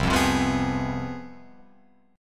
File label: C Major 7th Suspended 4th Sharp 5th, C, down, Guitar, Open F; strummed; CM7sus4#5